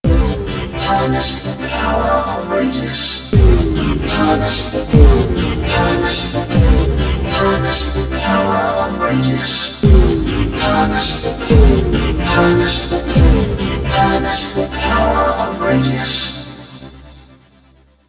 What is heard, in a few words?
Korg Radias-R